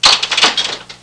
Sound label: turnstile, miscellaneous sounds